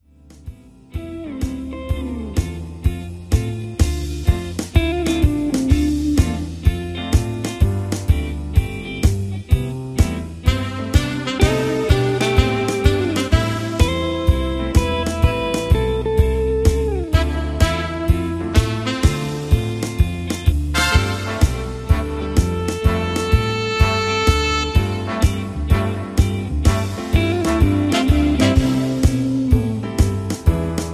Instrumental with No Leads

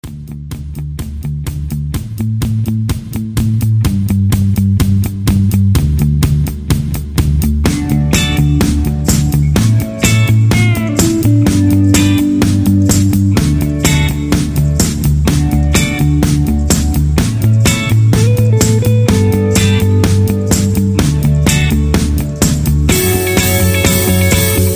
Instrumental No Leads

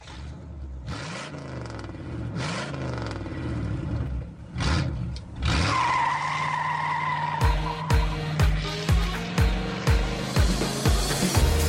instrumental with sound effects

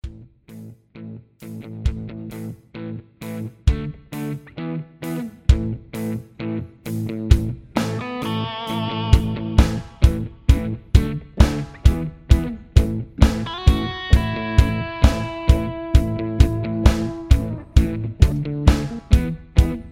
Instrumental with Harmony

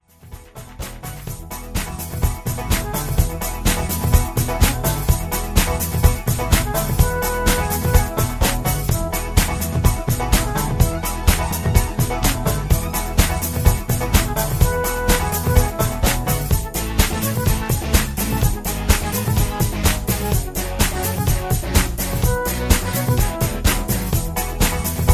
Hi Hat Patter

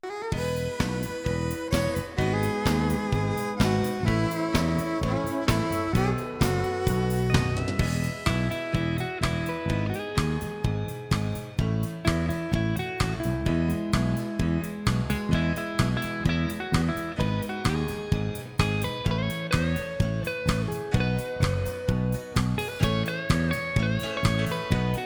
Instrumental

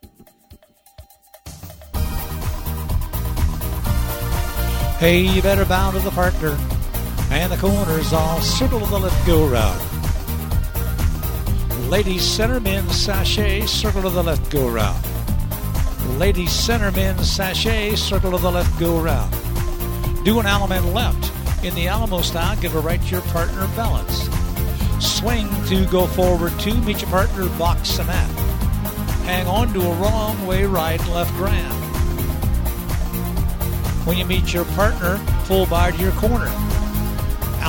Hoedown Patter

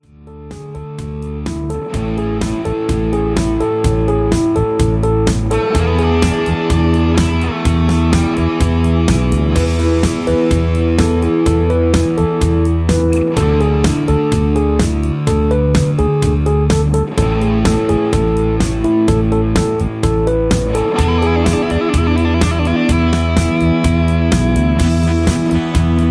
Instrumental with Leads; Singing Calls

